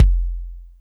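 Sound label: kick Rumble, Alesis D4; 300 Drum Machines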